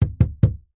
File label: sport pool snooker cue rubber base tap bang x3 wood floor; zapsplat